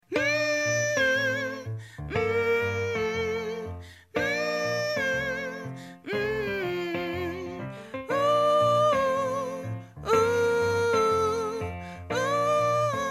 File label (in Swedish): bas